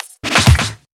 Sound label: Fill Ins 128BPM; Vengeance Samples